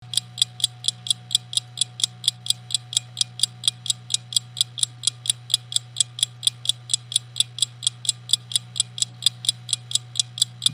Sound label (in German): spitzzahn ankerhemmung